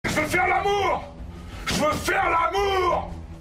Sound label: wood, zombie; minecraft; mob